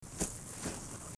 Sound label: steps and wet; Wet sand has a bit more squish to it than you'd think, but when comparing the 2 types , wet sand is a bit crunchier; North woods of Wisco